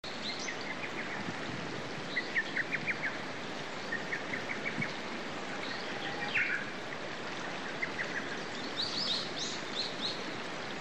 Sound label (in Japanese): hototogisu